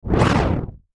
Whoosh Ascend Descend; Blastwave; Whoosh Sound Effects

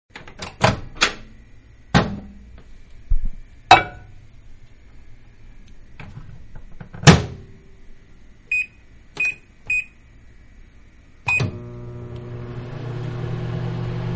Microwave; Kitchen; Household; Manhattan Production Music

